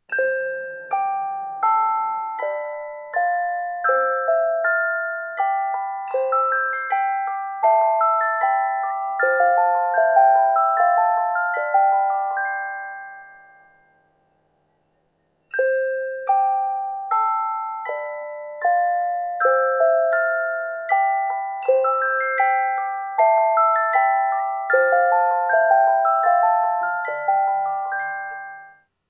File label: canon in d; J. Pachelbel